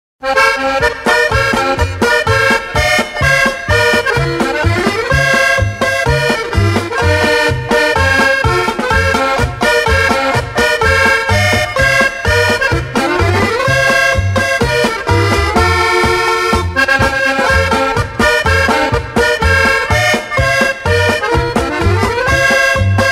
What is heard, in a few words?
Broken Reed Polka, accordions